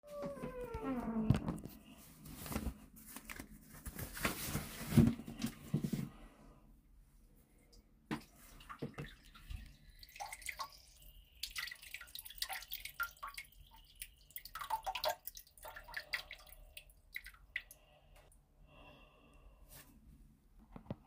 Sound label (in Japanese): おトイレで！オシッコですッ！; 収録直前の！